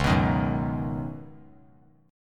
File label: C sharp 9th Suspended 4th C sharp down, chord; C#9sus4 strummed; JGuitar